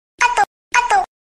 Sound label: sms tone; kakaotalk